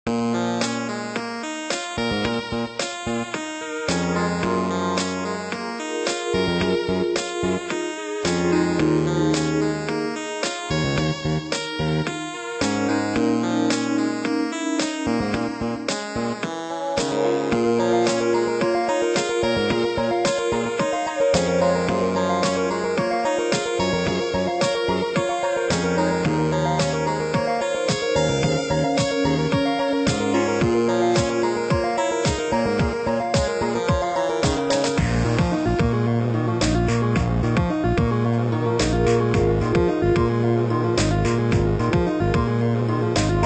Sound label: melancholy; super stickman golf 3